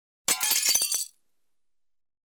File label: Very small window; The BBC Sound Effects Library